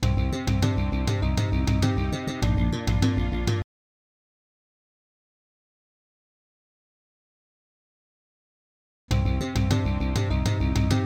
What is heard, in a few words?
intro, Guillemot MaxiSound 64 Home Studio Pro; soundcard test; Hocus Pocus